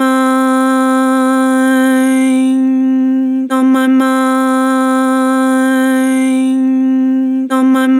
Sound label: Vibrato, Vocals; Harmor; On my mind